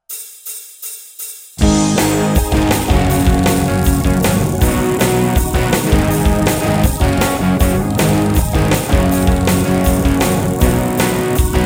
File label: race, games music